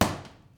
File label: Thud